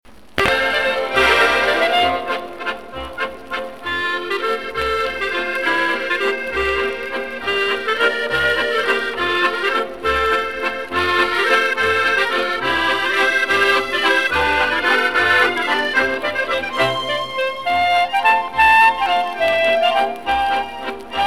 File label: Swiss waltz